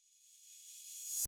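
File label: Reverse Crash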